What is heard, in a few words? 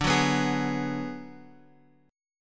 D 6th Flat 5th, Guitar, Open F; D6b5 strummed; x,x,2,1,2,3; JGuitar